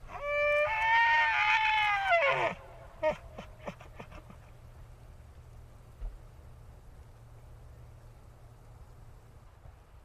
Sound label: Elk Bellowing In Forest